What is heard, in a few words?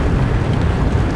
helicopter pad rotate move